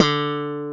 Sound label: Slap Bass; cubic